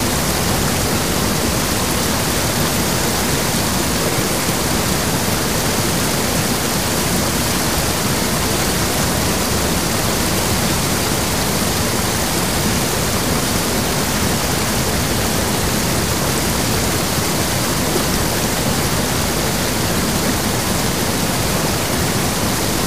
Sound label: Waterfall